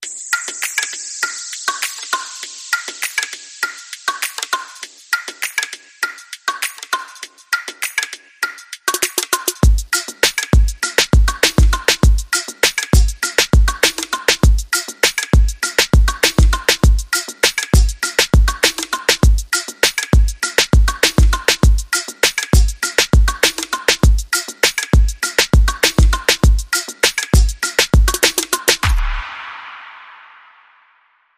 Get Down Low Beat

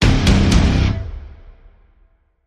Stab Guitar Triple (C)

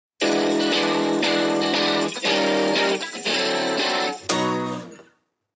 Kick Sounds

